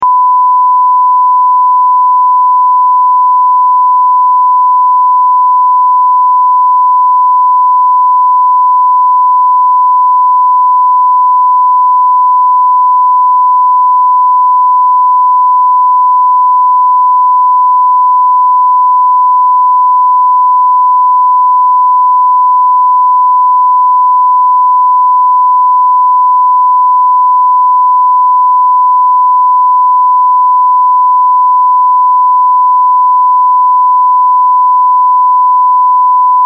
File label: Sine 1kHz